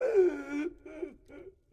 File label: death sound; guts and blackpowder